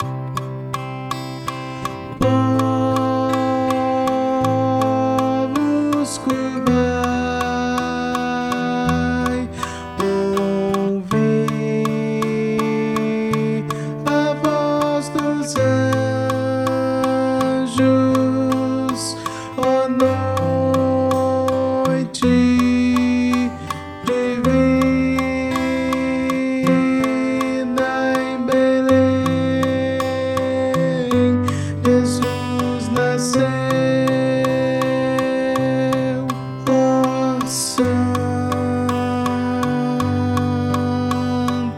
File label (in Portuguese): estudo tenor